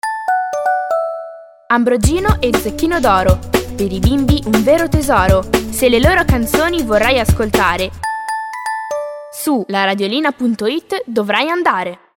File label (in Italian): Jingles; Ambrogino e Zecchino d'oro; La Radiolina